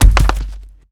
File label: stone